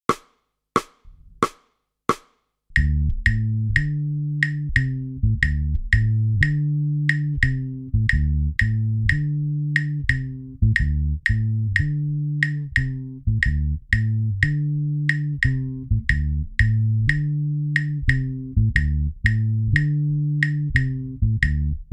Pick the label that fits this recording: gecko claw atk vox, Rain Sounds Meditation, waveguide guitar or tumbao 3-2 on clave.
tumbao 3-2 on clave